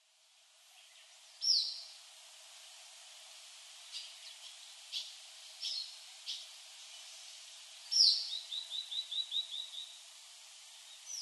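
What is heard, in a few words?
Pacific swallow, yona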